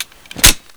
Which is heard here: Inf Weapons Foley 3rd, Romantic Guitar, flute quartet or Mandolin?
Inf Weapons Foley 3rd